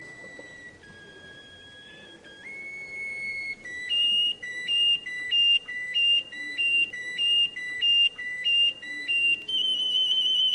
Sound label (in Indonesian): kenari rusia